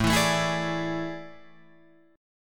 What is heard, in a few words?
A Major 7th Suspended 4th, Guitar, Drop D